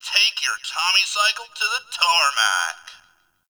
Game Bark; Atomicycle; Warframe; Ambience; NineteenNinetyNine

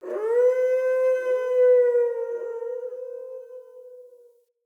Wolf Cub Trapped Call Distant; Animals; Wolf Cubs; Orengal Wolf Cub A

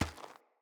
Mockery Walk Gravel, Footsteps; Mockeries

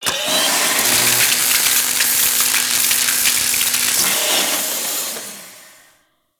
Welder Ice Work; Fortuna

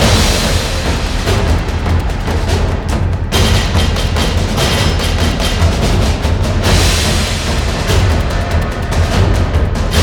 Death Squad Stalker Cue; Grineer; Warframe

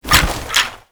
Bunraku Puppet Movement; Warframe; Koumei

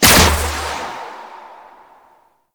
Bow Fire; Warframe; Weapons; Antler Bow; Prime Cernos

